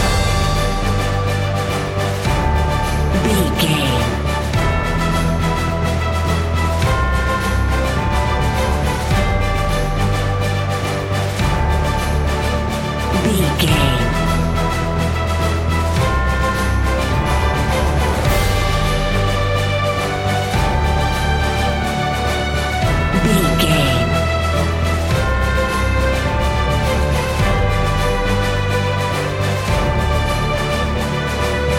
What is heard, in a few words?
cinematic adventure loop